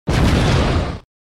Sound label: fist; attacks